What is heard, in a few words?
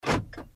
lock; deathmatch